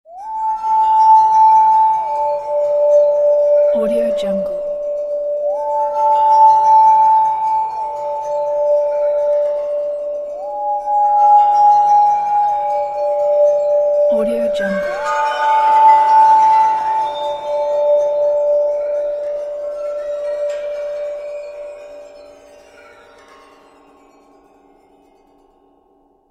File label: creepy whistling background